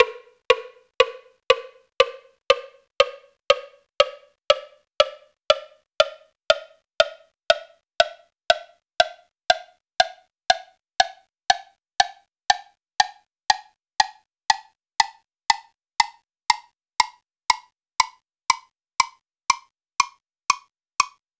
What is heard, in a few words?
woodblock tonleiter